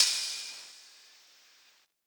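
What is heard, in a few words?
open hi-hat; eddyflux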